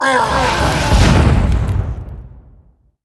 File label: boss death; inferno